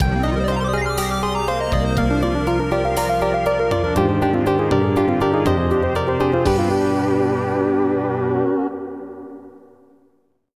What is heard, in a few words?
Game Over (LA)